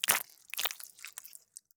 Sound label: Splatter Splat, Just Gore; SoundBits